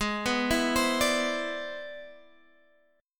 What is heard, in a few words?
C Augmented Major 9th Ab